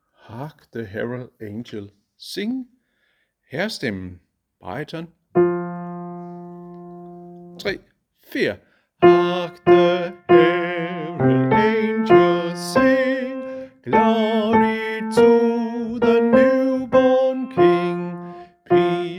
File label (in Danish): baryton; Hark the herald